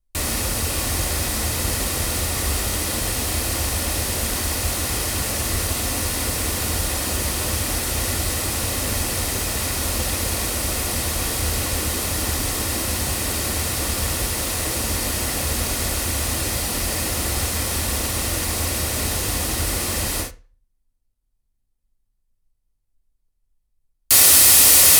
MT47 cardioid loudspeakers; TSM

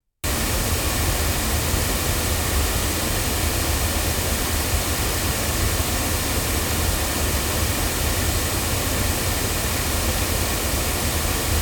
MXL V77 loudspeakers

